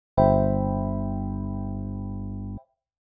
accord do majeur 7 basse sol; exercices

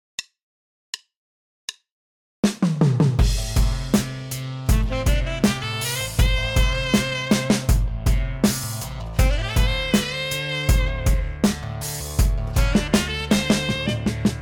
strange pop rock tempo 080 accompagnement; exercices

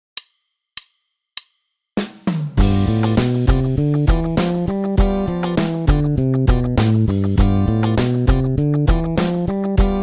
mode mixolydien, basse; exercices

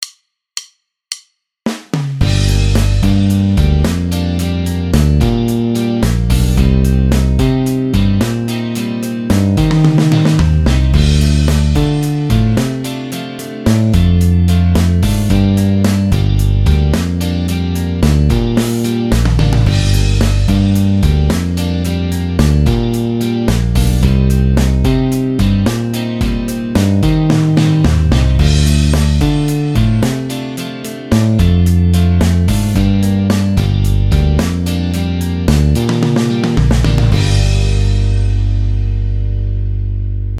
accents exercice, basse; exercices

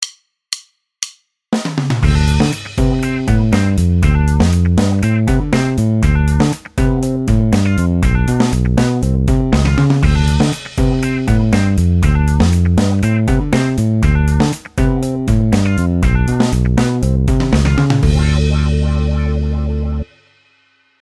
disco funk exercice, basse; exercices

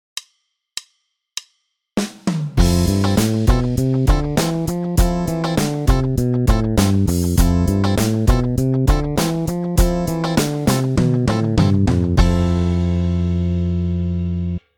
mode lydien, basse; exercices

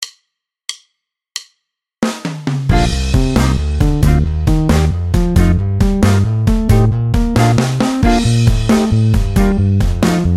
glisses

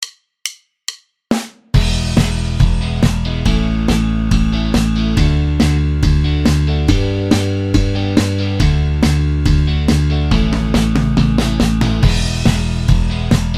slap exercice, basse; exercices

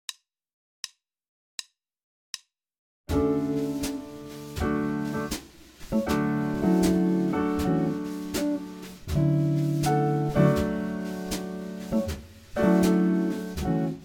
mode mixolydien accord majeur accompagnement, basse; exercices

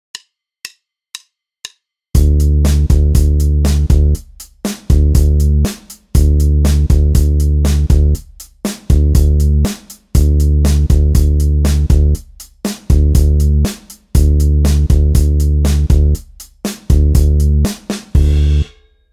debutants basse batterie

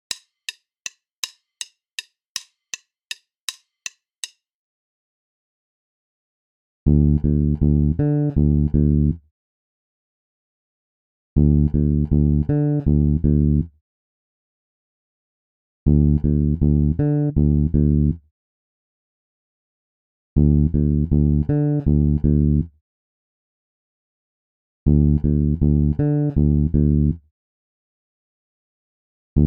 decalage triolets exercice basse tempo 080; exercices

